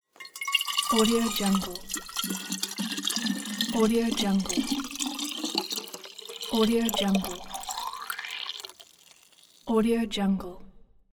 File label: filling flask